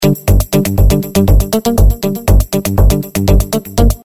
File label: rhythm loop funk dance